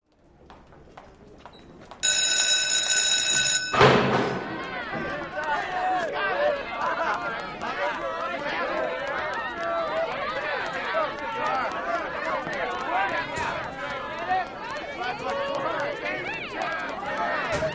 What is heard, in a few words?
School Bell Ring, hallway